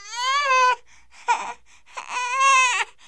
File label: baby; svencoop